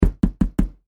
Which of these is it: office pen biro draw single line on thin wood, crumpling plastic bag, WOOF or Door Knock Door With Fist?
Door Knock Door With Fist